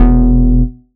Bass E, techno synth shot; Bass One-Shots; Ghosthack; Techno Synth Shots 2025

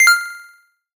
Collectibles Classic FX; Video Game; Cymatics; Video Game Sample Pack Vol 1

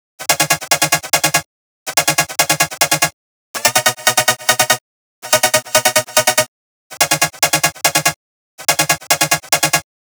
143 BPM D# Min Chords; Melody Loops; Loop Stems; Cymatics; Hyperbass Pt 2

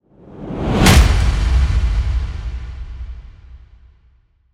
Impact; Hits

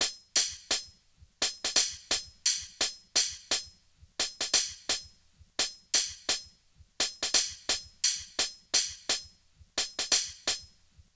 Drum Loop - 86 BPM Hihat, Boom Bap; Bristol; Cymatics - ANALOG EVOLUTION Beta

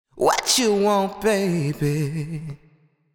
Sung Phrase Wet Watchu Want 105 BPM D Min; Cymatics; Euphoria Vocal Sample Pack

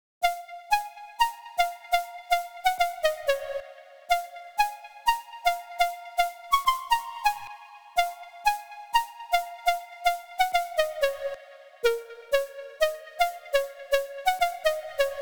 124 BPM A# Min Lead; Loop Stems; Cymatics; Reasons; Breakdown Loops

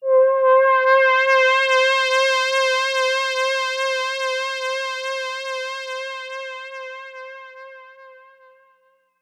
Memorymoog PAD Angel High - C; Synth; One Shots; Cymatics; Fantasy Synth Sample Pack

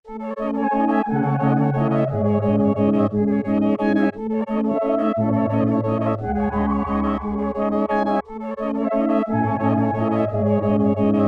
117 BPM C# Min, Melody; Cymatics; 2022 Melody Collection

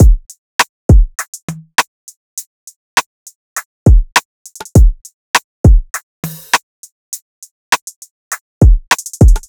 Drum Loop 101 BPM; Windy; Cymatics; Vibes II Drum Loop Collection

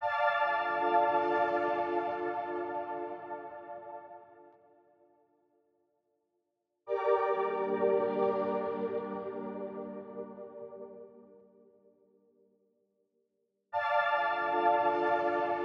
140 BPM D Min Keys; Loop Stems; Drill; Cymatics; 2022 Melody Collection - Drill; Woi

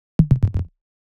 128 BPM E Min FX; Drop Loops; Volt

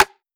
Perc; Cymatics; Drum One Shots; Percussion

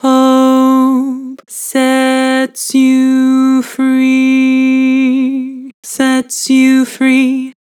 Vibrato, Vocals; Hope sets you free; Harmor Vocals

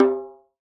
Djembe, World Percussion; Cymatics; PHARAOH Beta Pack; Pharaoh Premium Drum Samples (BETA)

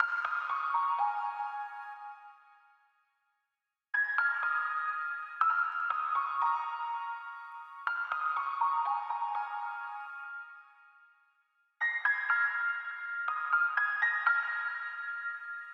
Piano Melody, 122bpm A#min; Construction Kit; Ghosthack; Summer Freebie; Acapella Kit

